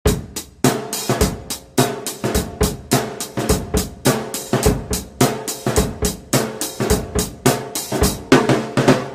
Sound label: Drum; Drum Cat; Mellow Island